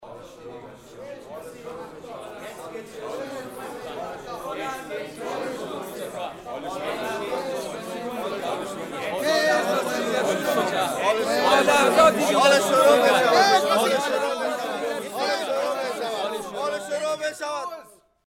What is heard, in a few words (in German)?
Geräuschmaschine